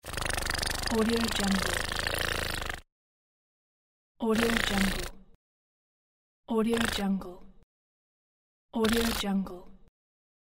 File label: funny farting sounds mouth and lips